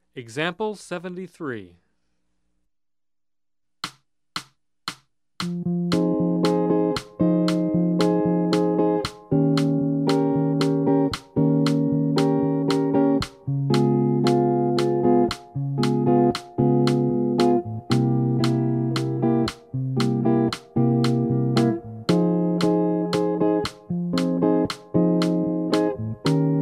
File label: Jazz; jue shi ji ta